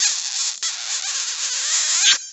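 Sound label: poceluy, kiss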